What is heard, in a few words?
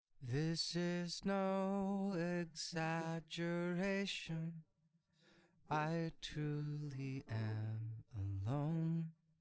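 with drums, instantaneous mixture; SiSEC11; underdetermined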